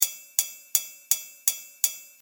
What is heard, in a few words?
Cymbals Monkey